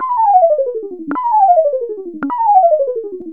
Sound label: sequence, spacy sequens; Zero-G Ambient 1